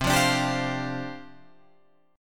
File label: C Suspended 2nd Suspended 4th, down, Guitar, Open F; Csus2sus4 strummed